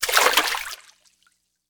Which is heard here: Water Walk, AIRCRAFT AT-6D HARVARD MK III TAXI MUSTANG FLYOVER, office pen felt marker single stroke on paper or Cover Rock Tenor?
Water Walk